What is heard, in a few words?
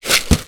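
fuse beads in plastic tub down on surface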